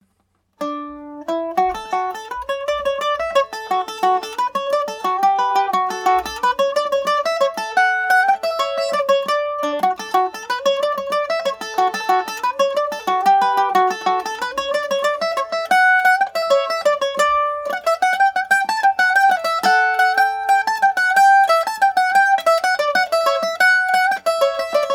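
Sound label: D major md304, Irish mandolin; humours of drinagh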